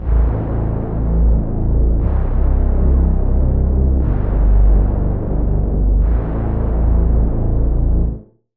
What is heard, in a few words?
Reese; Routed Sound; Serum 2 Expansion - Street Vanquisher Vol.1